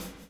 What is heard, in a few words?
BLOW; Spectrasonics - Backbeat